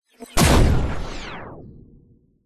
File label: science fiction gunshot with electronic charge and discharge; zapsplat